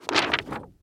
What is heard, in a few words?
Paper Movement; effects